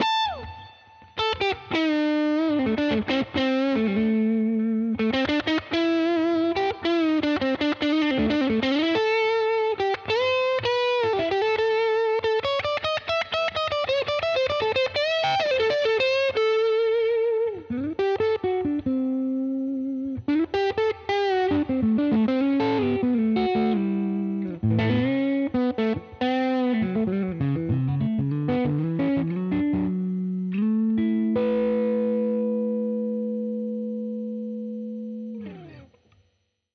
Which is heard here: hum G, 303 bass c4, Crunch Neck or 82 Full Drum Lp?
Crunch Neck